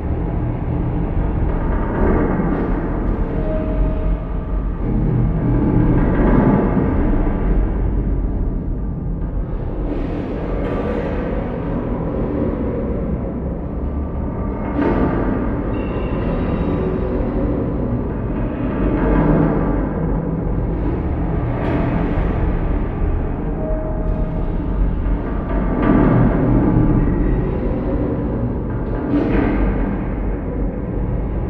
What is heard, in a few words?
chasm chains loop